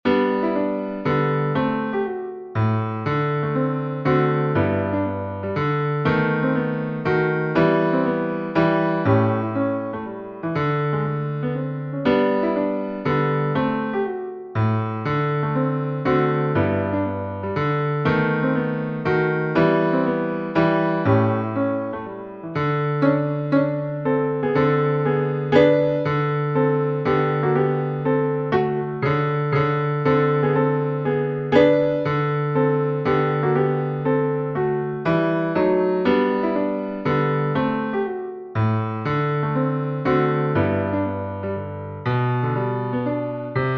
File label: polka mazurka, guitar